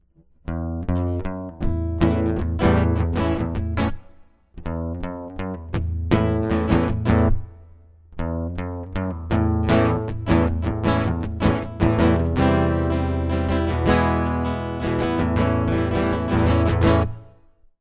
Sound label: guitar intro